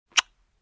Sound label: Kiss Smack